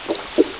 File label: Unknown sp Sound